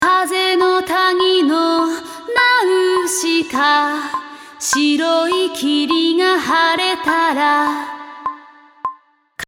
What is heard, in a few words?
Vocal Effect